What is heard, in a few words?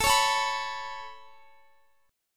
A Major Add 9th, Guitar; Aadd9 strummed; 4F,4A,5C,5G,5C,6E; x,x,21,18,21,19